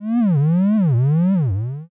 curse

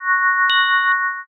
save point

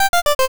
stairs down

